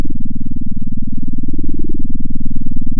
pop, sound of a small motorcycle

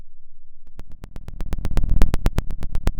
Sound of a fire crackling

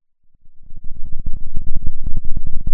Sound of a thunderstorm, center

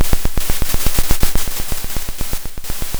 Sound of a drum roll

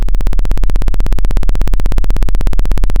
Sound of a plane taking off

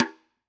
Pearl Piccolo Side Snr Off, drum

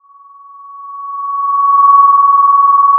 pop, Sound of a phone ringing

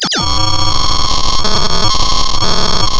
pop, tearing paper

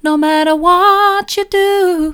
soft, vocal

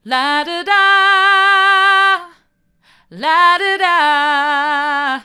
la do da hard, vocal

